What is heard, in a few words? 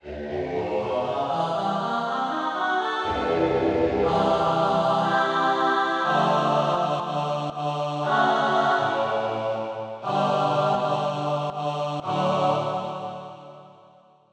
Spooky Vox; SoundFont - Traditional, Voice & Keys - Volume 2